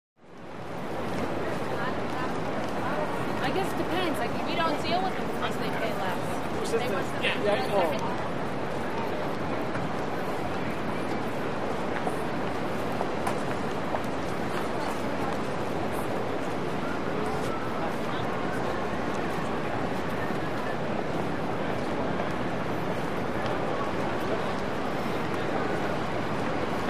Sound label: Sparse Pedestrian Bys - Walla And Footsteps Close On By. Medium Distant Traffic Roar Busy, With Sparse Distant Jack Hammer.; murmurs various